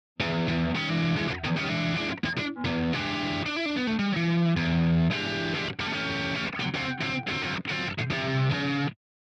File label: Saturator Guitar; Abbey Road